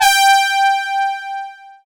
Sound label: SYN, analog